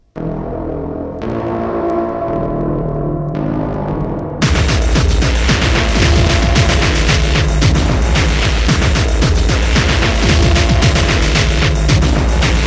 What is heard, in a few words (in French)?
Ambiance de sous sol; knibal